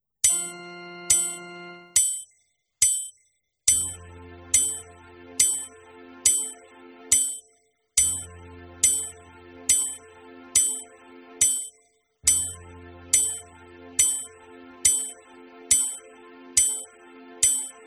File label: warm up exercises